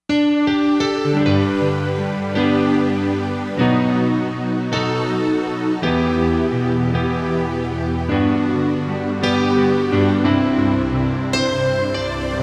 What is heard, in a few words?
Piano String